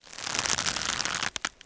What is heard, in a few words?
rope creak; Ringling Bros. and Barnum & Bailey; Wii